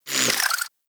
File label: Pistol Proj By; Warframe; Tenno; Guandao